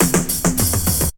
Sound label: Foward Roll, Drum Rolls; Zero-G Jungle Warfare 1, 2 & 3; 90 s Sample CDs